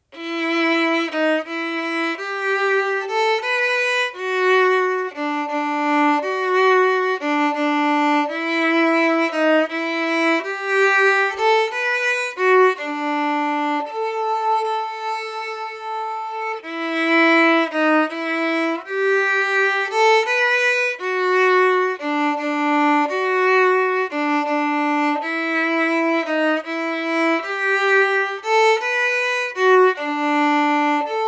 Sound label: arran boat song harmony, fiddle